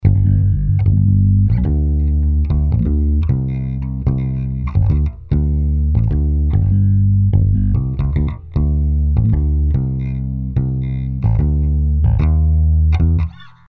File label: bass phase only; aaaudio